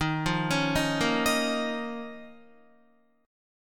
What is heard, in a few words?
D sharp 7th Suspended 2nd, Guitar; D#7sus2; arpeggiated